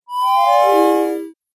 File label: 8 Bit Style Magic Portal; Video Game